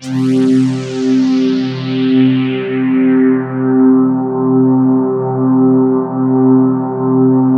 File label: RICH HARM; Greytsounds - Scott Peers Sound Engineering